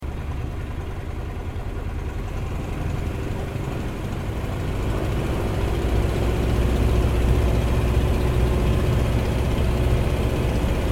aircraft sopwith pup replica takeoff interior stereo